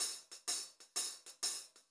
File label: 126 CL HH L, Pop-Rock Drumloops; USB Soundscan vol.37; AKAI; 90 s Sample CDs